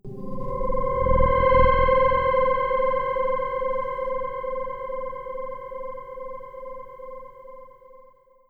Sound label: FX UFO Swell